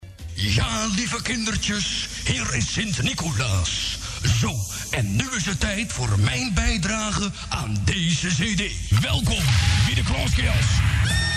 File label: Jingle; Audio Fragmenten; Arkan Radio 2